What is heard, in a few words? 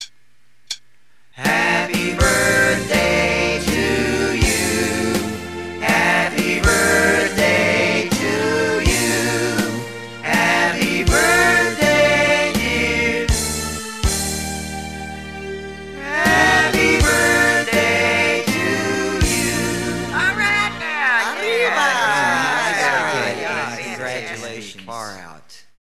Happy Birthday (Live Performance)